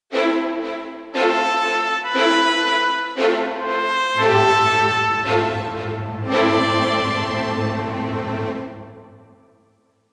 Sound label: Cinematic Battle Logo Theme